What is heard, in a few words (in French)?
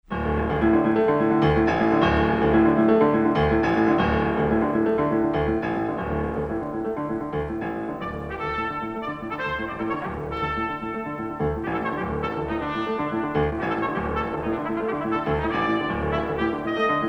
Concerto pour piano et orchestre Vivo Presto; NIGG